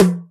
202 tom, Drums, Boss DR-202

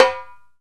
Timbales rim; E-MU Producer Series Vol. 2 – More Studio Essentials; Composer; Timbales Xswitch

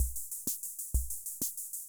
Ace Tone Rhythm Ace Loop, Drum Machines; 1967

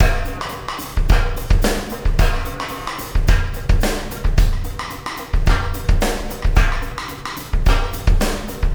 Wormhole Beat, Processed Drumkits

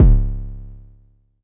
Low Kicks C#1; LIMAT Div Dept; Drums

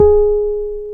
PNO, H.Pianet

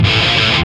GTR; Roland - LA Composer vol. 2

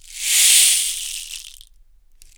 SHAKER AFRIC; Big Fish Audio; Ross Garfield Drums VOL-2

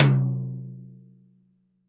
TD-20 Tom A, Roland TD-20 Toms

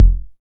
KIK 909, Roland; Perc

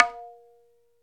TABLA NA; ILIO; Synclavier Percussion 2; World & Orchestral

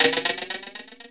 ECHO

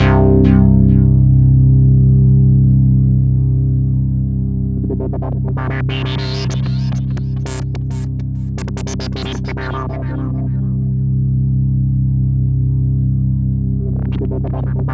Roland JV 2080, interval; MG INTERVAL

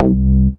Bass Note C

